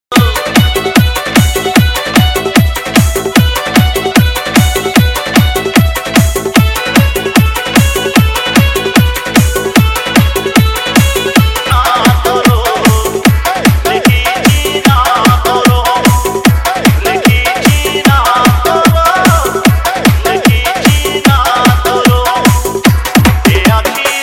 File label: Retro Bass Remix; Odia